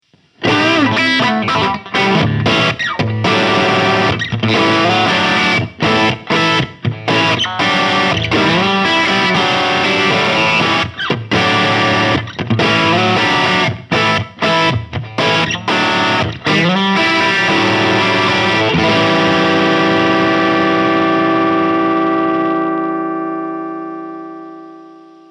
Sound City Master One Hundred Single Coil Cross Cable